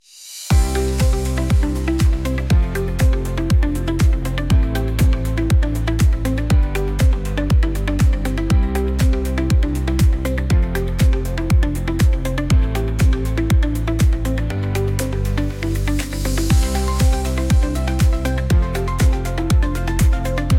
Quirky yet infectious synth-pop with irresistible hooks